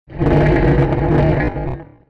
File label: PEACE IDLE; LockJaw; Mob